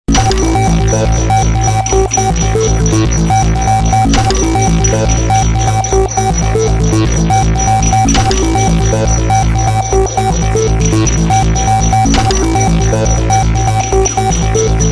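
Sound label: News Bed; Soundzabound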